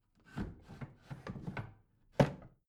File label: bathroom drawer open rattle